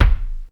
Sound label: Kick, Ensoniq ASR-X Pro